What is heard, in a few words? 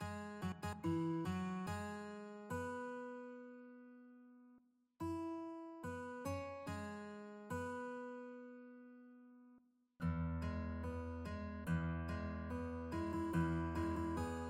GUIT